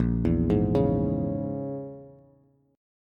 A sharp Minor 7th Flat 5th A sharp, Bass; arpeggiated; 6,7,6,6

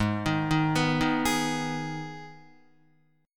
G sharp Minor G sharp down Guitar, chord; G#m arpeggiated; JGuitar

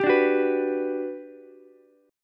G Major 7th, Ukulele; GM7 strummed

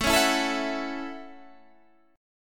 A Major Add 13th A, chord

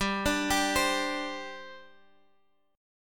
C Suspended 2nd, guitar chord

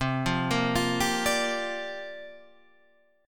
C 7th Suspended 2nd Suspended 4th C

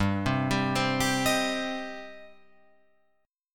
G Suspended 4th Sharp 5th, Guitar Open F; arpeggiated; JGuitar

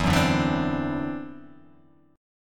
E 7th Suspended 2nd Sharp 5th, E, down

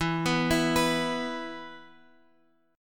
E 5th E; E5 Chord {X 7 9 9 X 7} arpeggiated

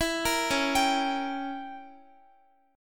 C sharp Diminished 7th, Guitar; arpeggiated; C#dim7; x,x,14,15,13,14

